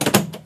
Latches; Foley; SFX; 7rystan's 600+ FREE EDM SAMPLES & DRUMS

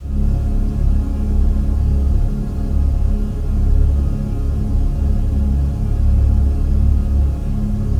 pad introductive Ab; synths pads; base pack

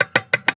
Random clicks; Foley; SFX; 7rystan's 600+ FREE EDM SAMPLES & DRUMS

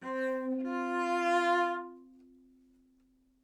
live string phrases, string phrase Cmaj 100bpm

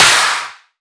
clap organic slapper; drums; base pack

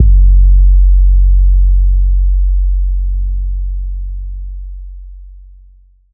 Trap bass, EDM

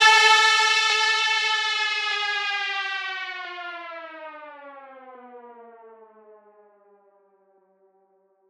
Cheap Siren; SFX; 7rystan's 700+ FREE COLOSSAL EDM SAMPLE PACK

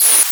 Eerie transmission; SFX; 7rystan's 600+ FREE EDM SAMPLES & DRUMS

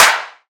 clap cuteboy; drums; base pack

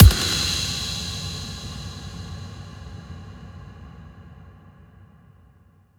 Impact

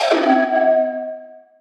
Skewed cowbell; SFX; One shot; 7rystan's 600+ FREE EDM SAMPLES & DRUMS

